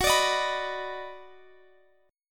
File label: D sharp Minor Major 7th Sharp 5th F sharp